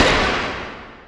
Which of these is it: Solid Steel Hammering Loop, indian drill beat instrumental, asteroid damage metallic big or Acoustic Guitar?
asteroid damage metallic big